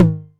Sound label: Tom Hi, Novation Drumstation